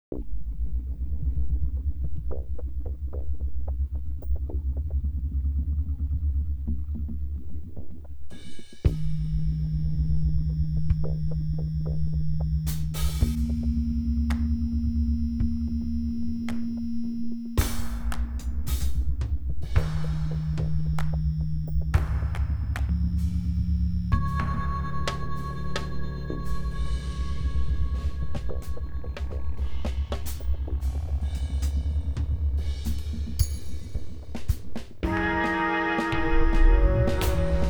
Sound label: Deep Whale Adagio free atonal